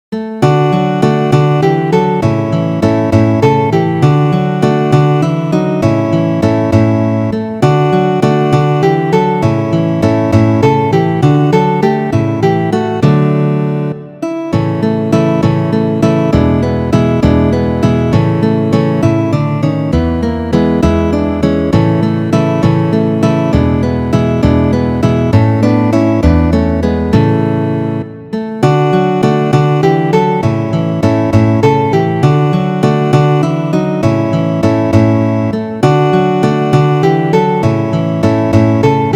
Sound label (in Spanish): contradanzas; Ferdinando Carulli